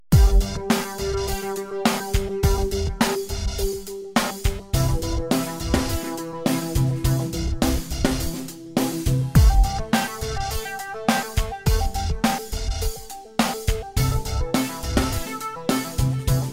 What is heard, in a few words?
Drive Music; bridge constructor portal; android